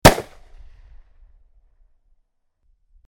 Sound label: unload, weapon; weapon-paragon